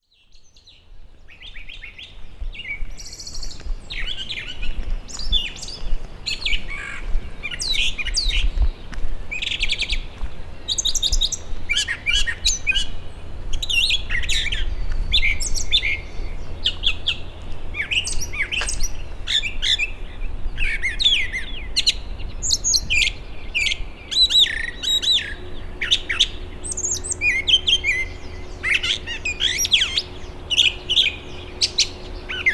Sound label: brown thrasher song; by david lapuma via xeno canto